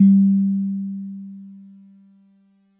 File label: DreamLike DX100 G -1